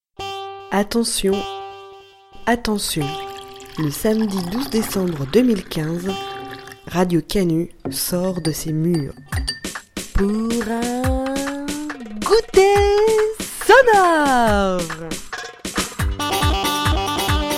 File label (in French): jingle; gouter sonore